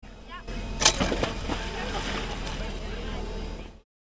wasserplatscher

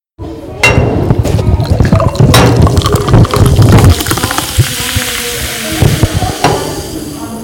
Einschenken

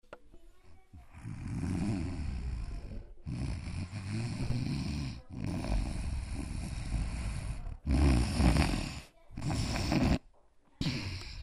schnarchen

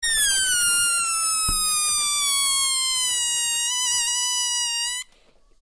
silvesterrakete

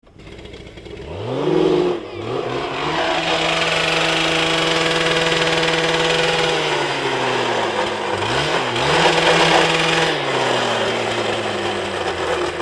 trennschleifer

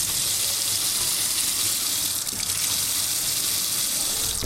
laufendes Wasser aus Wasserhahn

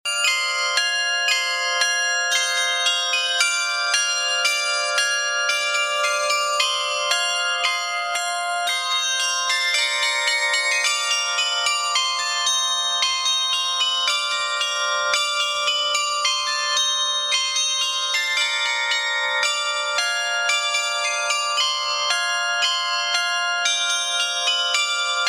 glockenspiel

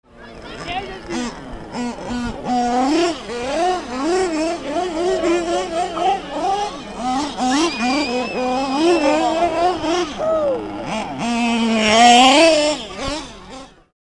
rc-auto

